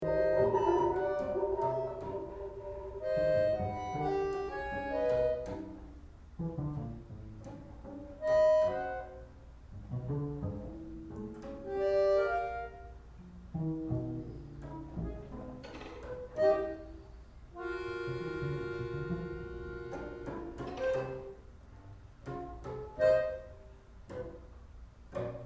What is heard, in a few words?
String Suite